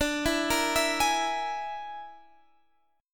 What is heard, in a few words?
A sharp 7th Flat 5th D